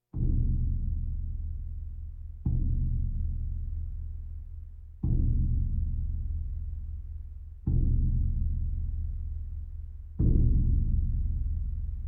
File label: Percussion Bongos Tympani rolls Gongs Drums